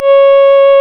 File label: REED C#; Big Fish Audio; Synclav FM